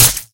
Stone Flashes; gorillakingdom

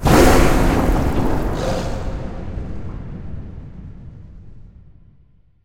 wild Heaven Hit; archangels

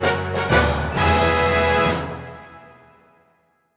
win Medium; robin hood

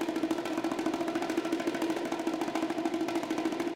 nudge loop; rise of maya

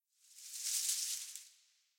initiate Leaves; robin hood

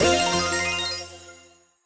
small Win; twin happiness

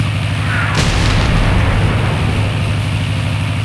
bomber bomb blast

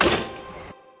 spin Button Click; hiddencoinsofegypt

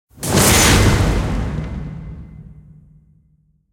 super Mega Win; goldengrimoire

